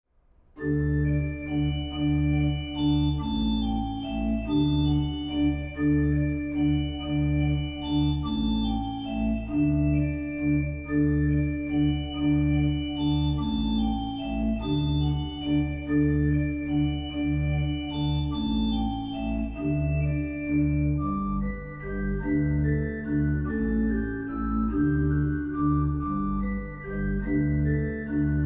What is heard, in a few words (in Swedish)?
Polska, orgel; Spela orgel